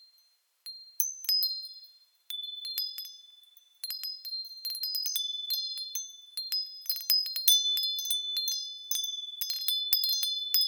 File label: dring